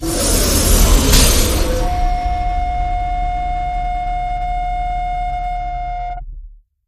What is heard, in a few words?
Hit Jet Fuel